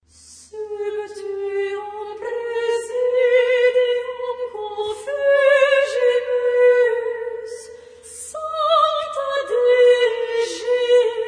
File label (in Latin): Antiphona sine organo; chorus; Charpentier H28; Antiphona sine organo ad virginem Sub tuum prasidium